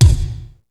MPC 60; Rubber Chicken Software Sample Bank; DRUMS + PERC; AKAI

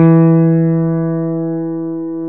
CP70, Keyboards Old School; USB Soundscan vol.09; AKAI

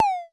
Dual-Percussion-Synthesiser; Klone

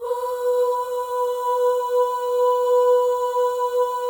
OH, FEM, Symphony of Voices; Spectrasonics

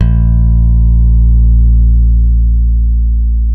PRECISION BS B 2, Bass; Spectrasonics; Bass Legends

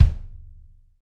Pop Kick; Roland BK-5

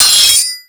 SW HIT, MORESWORD; Total Control - Action Cutz

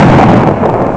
thunder groll; ST-XX Amiga Soundtracker Sample Packs

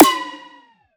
FX Snare; Antidote Advent; Infected Track Drums

